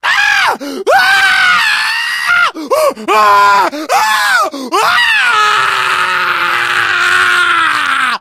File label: death scream, survivor voice; source engine; left 4 dead 2; manager